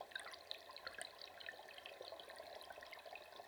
Waterway E Light

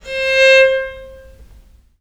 viole C4; Historical Instruments; VIOLE MUT; USB Soundscan vol.23; AKAI

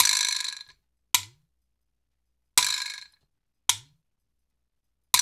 Vibrottles

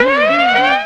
DANCE FX; Best Service; XXL Production Effects; Sample CDs

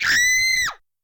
Scream

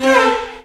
Dance Mega Synth; Best Service; 90 s Sample CDs

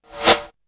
Reverse snare, Drums; ZERO G - Datafile DISC 3

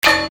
Percussion, EuroDance

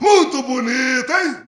Brazil Vox; Roland BK-5

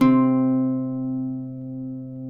CUATRO C 3, Guitars; Spectrasonics; Hans Zimmer Guitars VOL-2

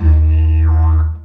Didgeridoo Kit Samples

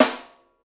St Snare, Roland BK-5; Snares

